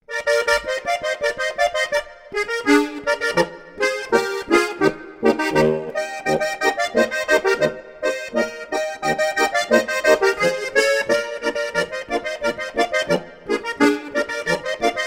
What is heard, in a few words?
weerberger steinschaf boarischer